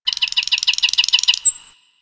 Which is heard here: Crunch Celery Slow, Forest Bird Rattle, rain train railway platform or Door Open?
Forest Bird Rattle